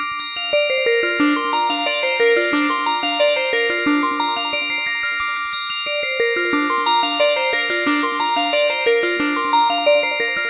synth pretty descending arpeggio; SYNTH AND ELECTRONIC

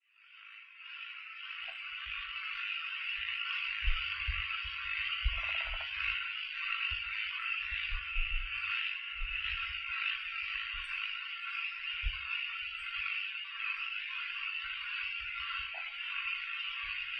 frog ambience; Zoo and Nature

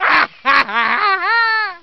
Laugh Deranged

